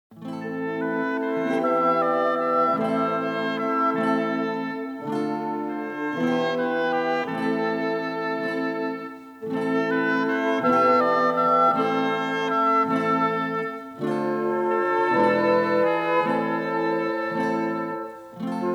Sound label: medieval romance